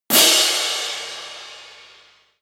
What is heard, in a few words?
clash cymbals